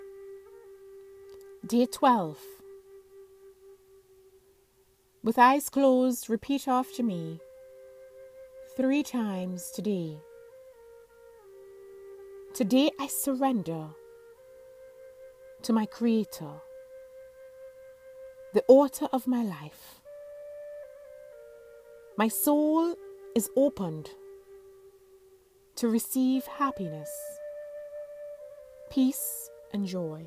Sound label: healing mantra